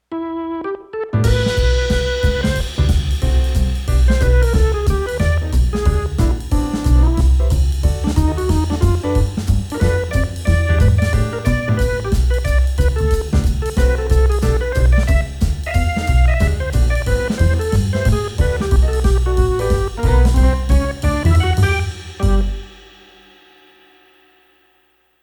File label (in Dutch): Jazz kort